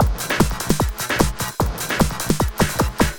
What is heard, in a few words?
LOOP, Eurotech; Sounds Good